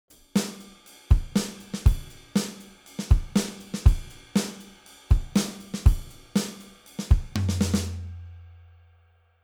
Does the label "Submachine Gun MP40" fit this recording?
No